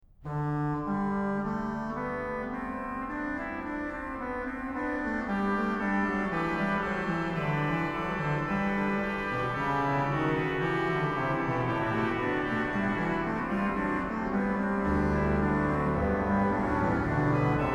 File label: Was Gott tut, das ist wohlgetan, organ; BACH; BACH ORGAN WORKS; Chorale Preludes